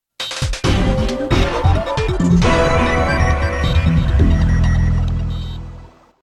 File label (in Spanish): Tema de victoria; Game & Watch; SSBM